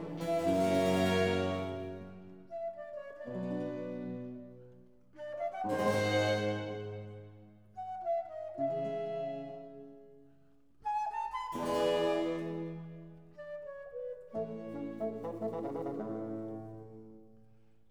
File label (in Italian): Concerto in Sol minore, Largo; RV 104 'La Notte'; Antonio Vivaldi; Concerti e Cantate da Camera (2002)